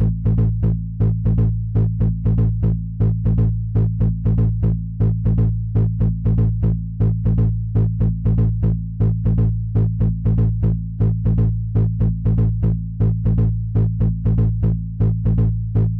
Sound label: bass; openspace3d; oculus nation